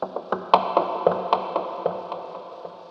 scary distant; svencoop; zombie nights

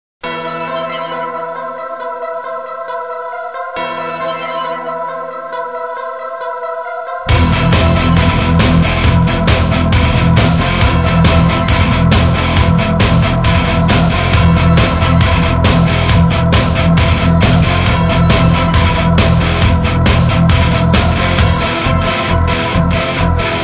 rythm

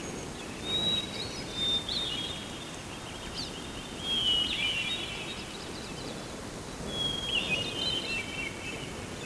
very nice Birdys loop

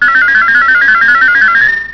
machines

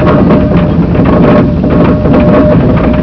big gears; dm clocktower

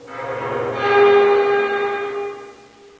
long ambient stress

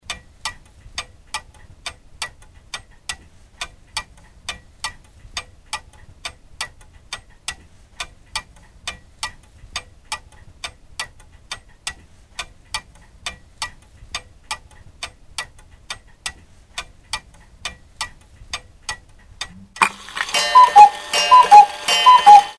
huey timer

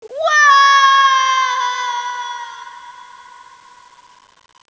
fall deep

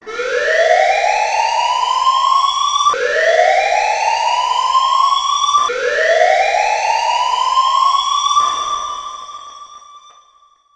siren

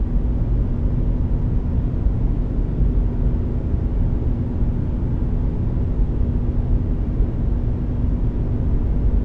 drone machine

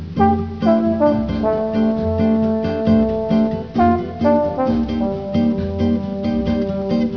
mall music

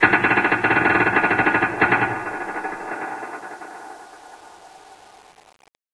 distant creak; dm cavernous

